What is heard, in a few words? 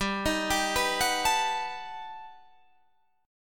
G Augmented Major 9th, Guitar, Open D